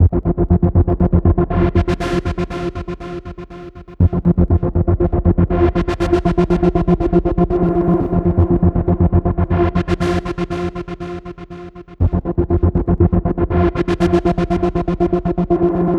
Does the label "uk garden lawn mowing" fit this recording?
No